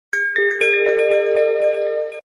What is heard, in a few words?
xz2